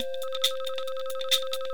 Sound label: LOOP FX, Big Beat Drumloops